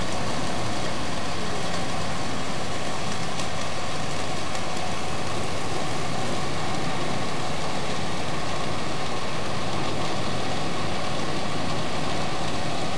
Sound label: Rain